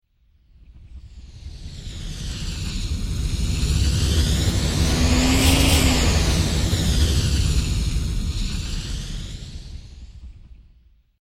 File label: Whoosh Sci Fi Space Vehicle Large Slow, Whoosh Space Vehicle Slow Spacecraft; Spacecrafts (Sound Effects:Science Fiction)